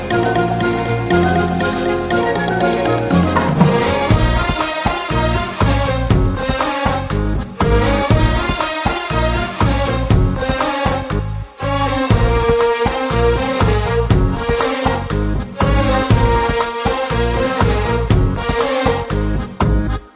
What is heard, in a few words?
BG Musiq